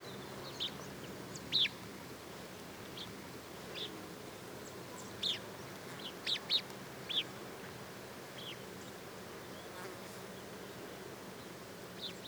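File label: some very short calls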